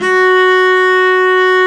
cello, Arco Solo; String Master Series

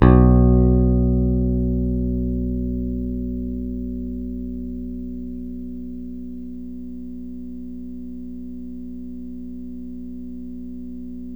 PICK BASS, Electric Basses

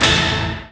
Dance Hit; Best Service Gigapack 1

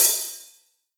Acousti Hat Hit, hihat; ultimate hihat; Hits

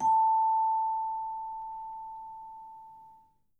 A5, Percussion & Harp; Miroslav Vitous

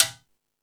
Latin Drums; E-MU Pro-Cussion

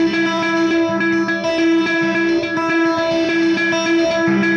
Arpeg Guitar; Session 02 - Clinical

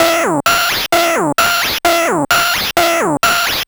Sh101 Loop, 130BPM

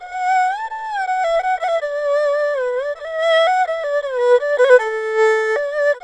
ERHU, 80; INIS - Opium

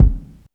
BD, Dance Mega Drums, HIP

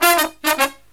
Brass Riffs; USB Soundscan vol.29 - Killer Brass Riffs; AKAI

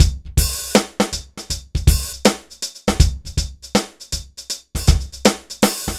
dry Loop BPM 80 Dry, AKAI S6000

